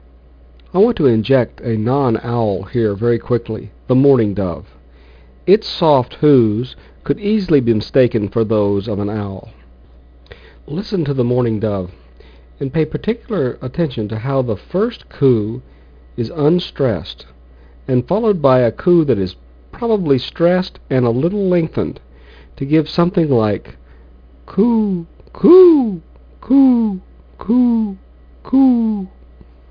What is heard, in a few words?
owl; NatureWare: North American Birds - Sight & Sound